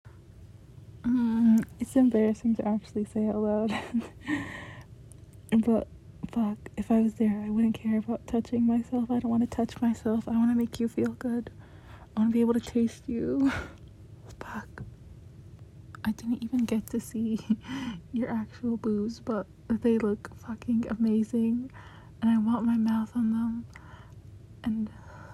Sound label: aaaaaaahhhhhhh